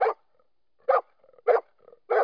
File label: DOG; outlaws